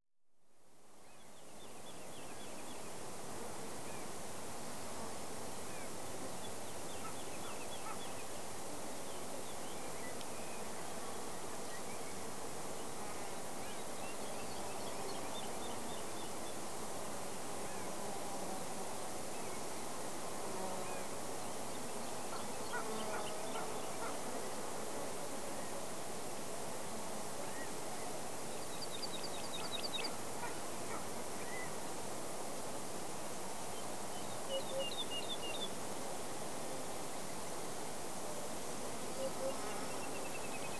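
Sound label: Cultivated Land (Semi-Arid); Rural Soundscapes; Late; BBC Sound Effects Library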